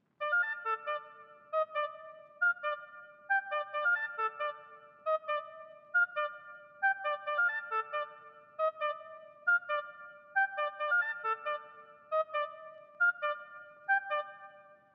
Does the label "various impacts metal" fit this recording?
No